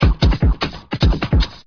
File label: mental 150, drums